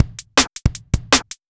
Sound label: DRUMLOOP D+B 160, Drum+Bass Loop; eJay